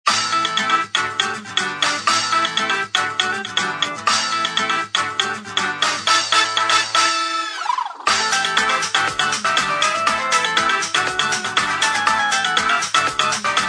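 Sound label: Latin Fever, ringtones; KV500 Cookie; Extreme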